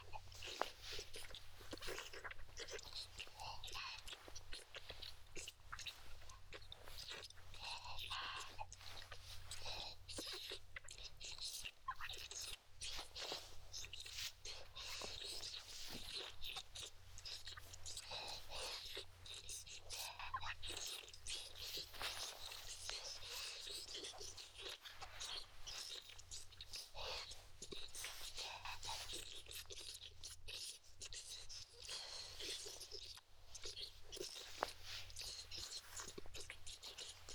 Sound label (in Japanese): エクストラ 添い寝 W フェラチオ; リアルサウンド